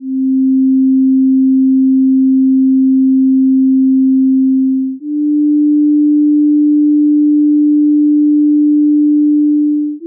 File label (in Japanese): 正弦波1本による音階, 5秒／音; 付録D：音階・音律; 各種音律